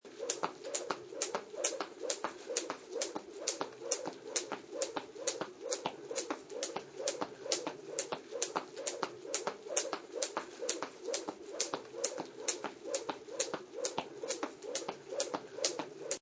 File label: Jumping Rope; Jumping Rope Ringtone